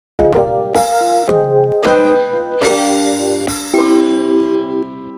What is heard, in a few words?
Every instrument song